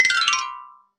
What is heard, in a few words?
transform, magic